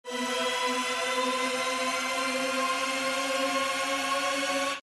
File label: respin snd; netent; jackhammer